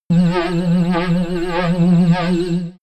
alienation

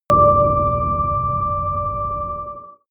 dark noise beautiful bell

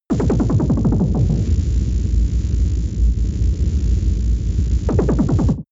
space alien engineroom calm

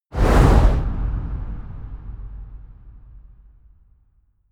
wipe

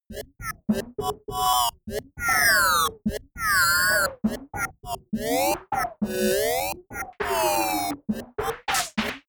sequence crazy noise percussion lead

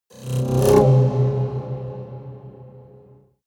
haunted strings roll

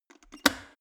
plastic water boiler close lid fast

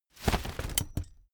umbrella big open full hard

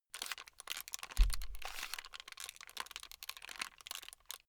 plastic rattle slow

